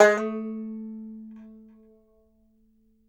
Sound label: BANJO NOTES, A 3; Sampleheads; Whole Lotta Country 1 & 2